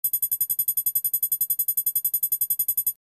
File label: Scanner Pulsing High, drones